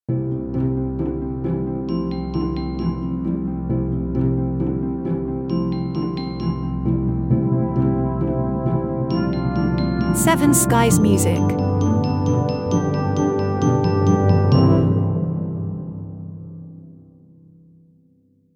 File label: Crescendo Stingout